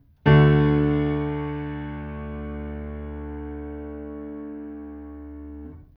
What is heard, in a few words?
chord